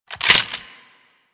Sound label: Sniper Rifle Reload; Grineer; Gorg